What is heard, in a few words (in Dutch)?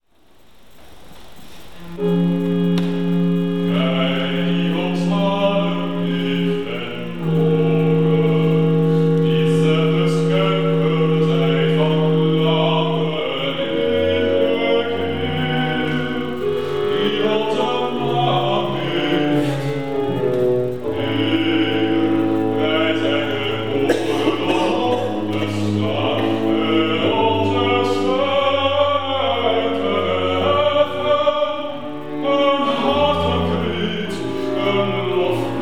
recitatief bas